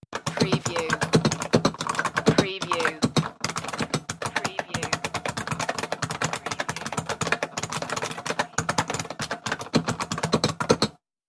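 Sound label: Old Keyboard Typing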